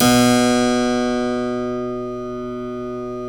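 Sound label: B1 clavinet left, Keyboards Acoustic; USB Soundscan vol.17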